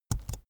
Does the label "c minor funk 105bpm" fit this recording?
No